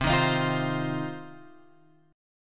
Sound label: C Minor Double Flat 5th, chord; Cmbb5 Chord {8 6 X 8 6 8} strummed